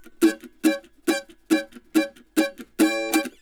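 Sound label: Country Mandolin (Verse2 Sec 1); Digidesign; Pro Tools Essential Loops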